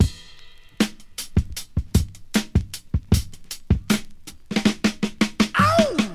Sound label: 104 bpm drum beat e key, Free drum loop - kick tuned to the E note. Loudest frequency: 944Hz